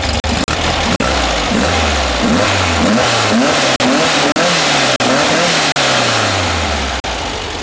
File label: tuning